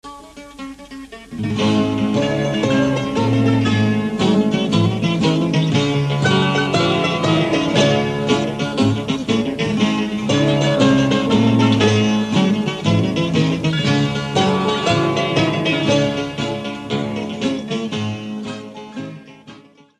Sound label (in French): Chasapikos - Grece